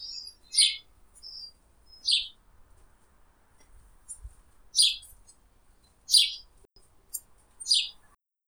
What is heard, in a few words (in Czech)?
Vrabec Domaci